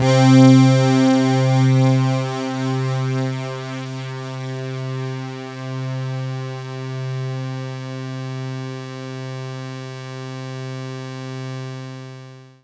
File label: C3, Synth Line; 90 s Sample CDs; NiceBeats; SynthLine VOL-31